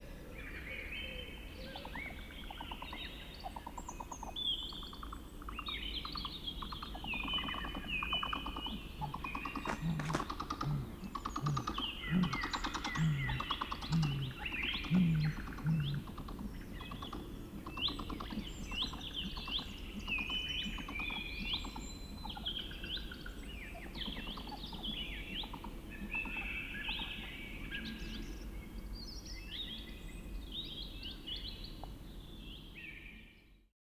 Lapland Owl Food Delivery With Low Chittering Of Female Arrival Hooting Of Male